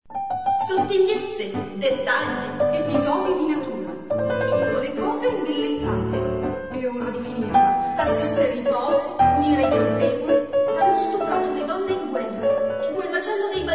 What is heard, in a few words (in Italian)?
Voce fuori campo e minuetto; minuetto (L. Boccherini)